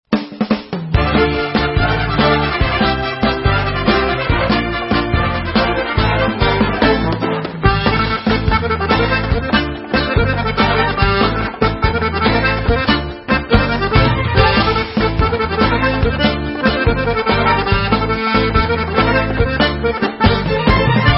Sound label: Debka Instrumental; Debka Eden